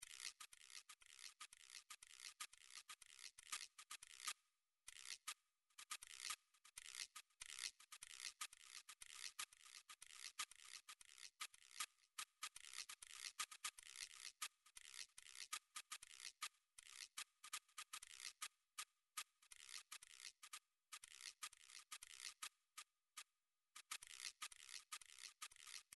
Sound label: midi drums percussion guiro; midi vol 4 drums percussion